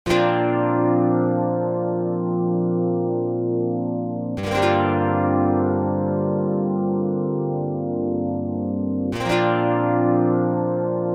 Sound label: karplus 2